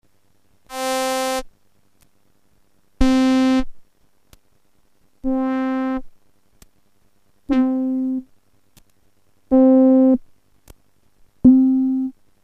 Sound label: cs60 presets